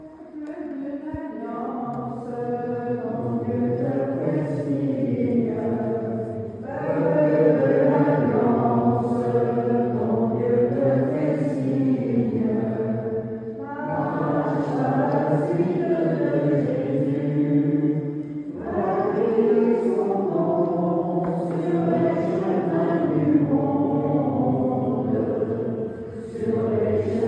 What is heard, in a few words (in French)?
chant Peuple de l alliance; Cimetières du Mellois